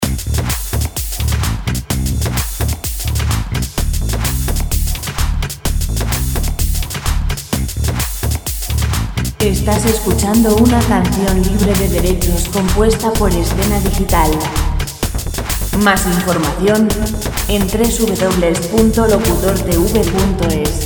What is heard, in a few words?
bass, pop musica libre